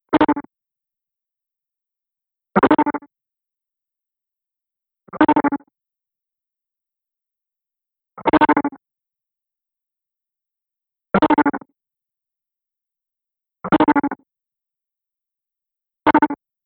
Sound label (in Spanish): gallo